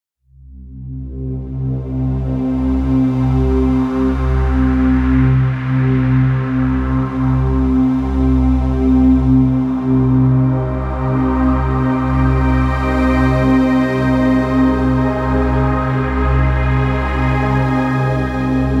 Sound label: universe pad